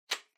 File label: Power Strip Hand Gentle Unplug